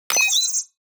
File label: click